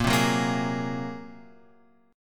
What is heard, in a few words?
A sharp 7th Suspended 2nd Sharp 5th; A#7sus2#5 Chord {6 3 4 3 X 4} strummed